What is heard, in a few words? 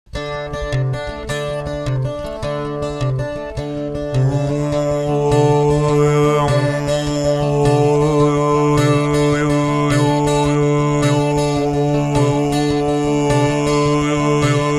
wail